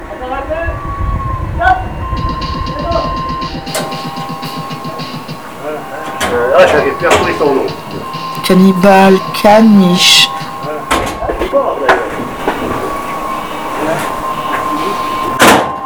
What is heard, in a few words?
jingle; refugees from beyond